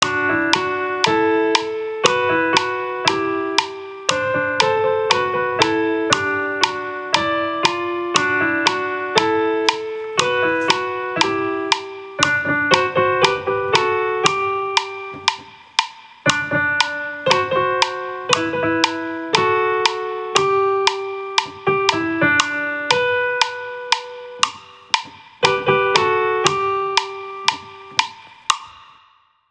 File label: melody and harmony piano only; Winnie the Pooh; NYTE Tracks